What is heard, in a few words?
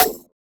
Percussions